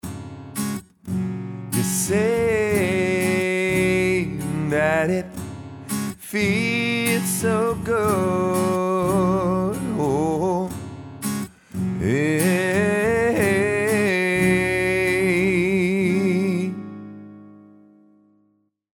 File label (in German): Audix VX10 Sänger Comp EQ